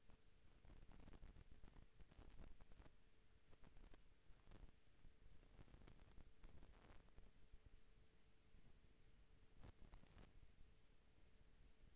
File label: HYDROPHONE 16000Hz; JASCO AMAR HYDROPHONE 740